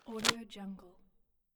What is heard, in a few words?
book close